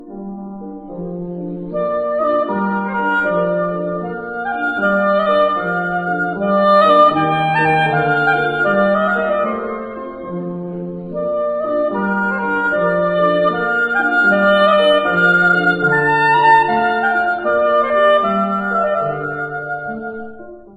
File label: oboe; INSTRUMENTOS MUSICAIS